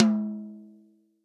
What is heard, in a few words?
REAL DRUMS POP KIT